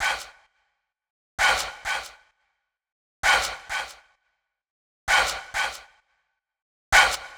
Fx Vox Loop 130; Equipped Music; Loungin House

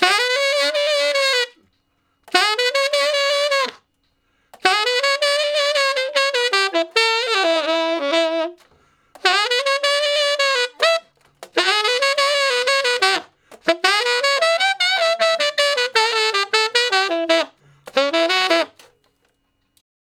Sax Shuffle (E); Solos; Ten Sax

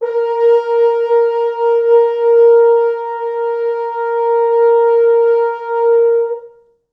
4 FrHorns; A#3; East West; Quantum Leap Horns Sax; 4 FrHorns MOD

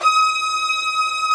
STR VIOLAS; Roland - LA Composer vol. 1

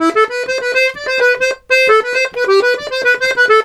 accordion, Complete Accordions; USB Soundscan vol.40; AKAI

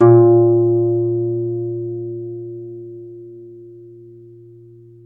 CELTIC HA, Celtic Harp

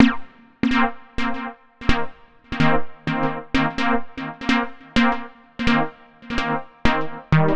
Retrofit Pads, 127bpm; Zero-G - Soundclash Nu Dance Electro; Construction Kits

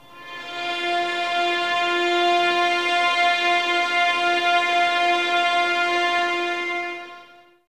VS F 6, String Ensembles, 11 Violins, Sul Ponte; Miroslav Vitous

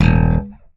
bass slap; Xsample VOL-1; SLAP+THUMB

